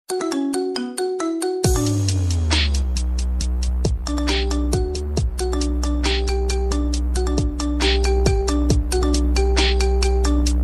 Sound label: marimba remix